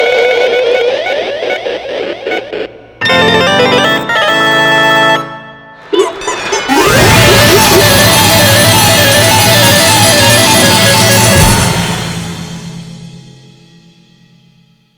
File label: voting slots end success; drv3